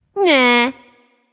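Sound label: tongue, female voice; smite; voicepack; hera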